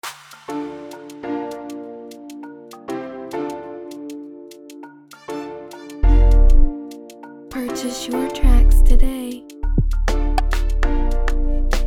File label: Type Beat, afrobeat instrumental